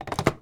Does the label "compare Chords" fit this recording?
No